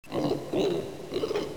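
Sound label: zombie bomb idle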